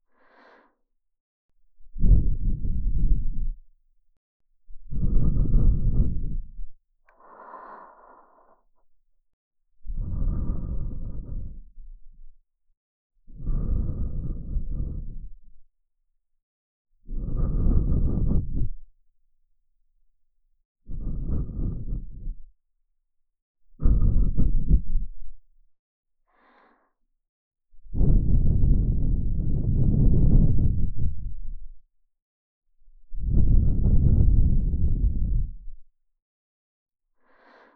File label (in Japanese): 耳ふ～; バツイチお姉さん; 耳元に感じる鼻息吐息